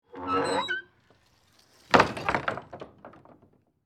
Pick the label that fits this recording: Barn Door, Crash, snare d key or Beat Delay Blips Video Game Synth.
Barn Door